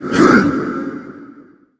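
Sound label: attack vox; gundyr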